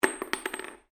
Cartridge 7.62mm impact polished wood, shell casings; shell casings of different calibers impacting on different surfaces